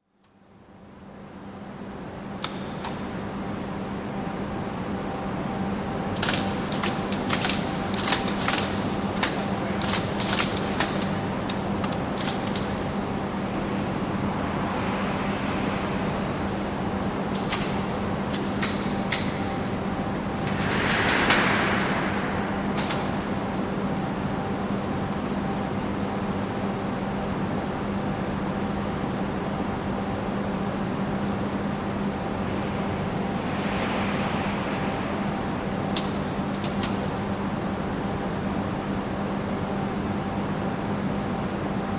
Stainless Steel Making, hot plate mill, contactor house with occasional distant voices; Grinders; BBC